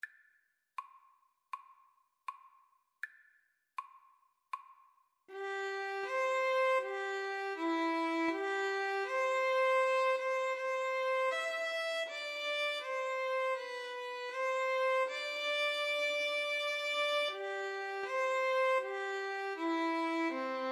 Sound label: violin cello duet